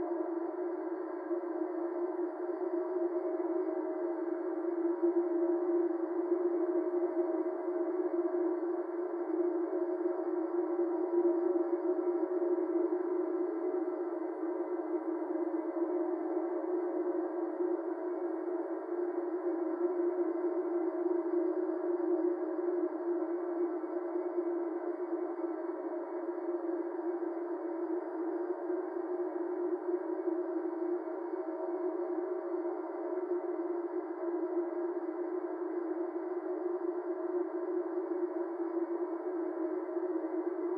Drone cold windy texture with rising and falling moaning elements in backgr; background